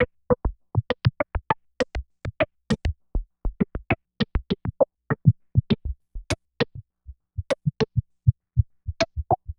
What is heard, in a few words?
Filtered, Organ, Loops, Effected; Sonic Foundry (Sony Creative Software); Joe Vitale Organ Donor